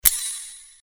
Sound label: Sword Sound; Fighting Sounds; FXHome